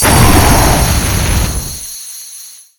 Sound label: salt